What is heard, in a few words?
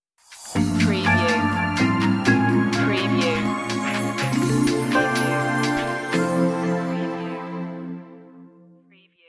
pop vibez vibes